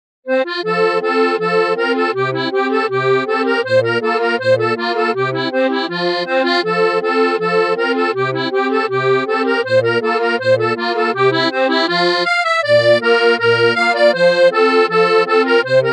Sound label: schottische, folk